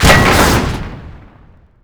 stug destroy main gun; WAR

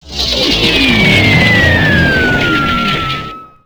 shell; WAR

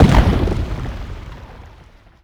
medium impact generic; WAR

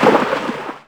water splash small; WAR

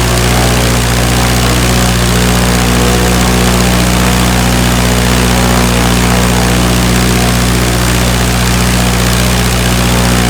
henschel constant fly; WAR

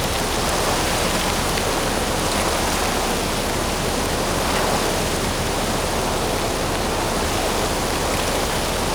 aspen wind close; WAR

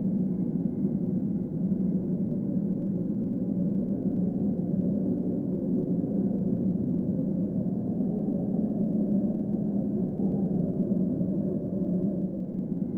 eerie wind low; WAR

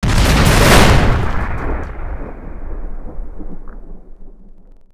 bridge blow stereo; WAR

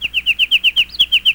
kinglet; WAR

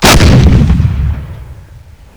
tiger cannon fire; WAR

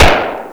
colt 45; WAR

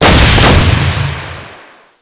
electrical explosion combo; WAR

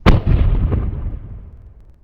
distant cannon; WAR

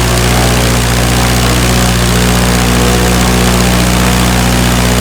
henschel exit; WAR

